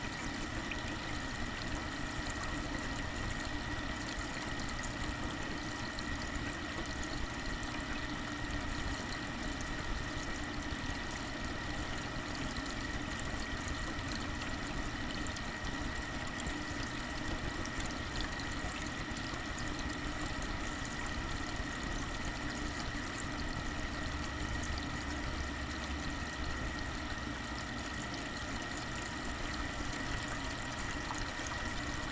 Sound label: Sink and Faucet; Fans and Drones